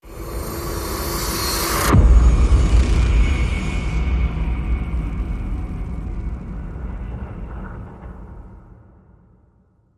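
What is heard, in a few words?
Hit Hor, horror hit; Embalmer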